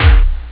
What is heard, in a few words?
sdrum; Amiga Soundtracker Sample Packs